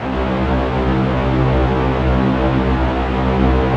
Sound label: SPACE STRINGS, Yamaha VP-1; Music Store